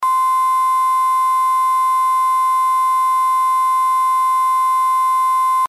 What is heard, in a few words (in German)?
sinus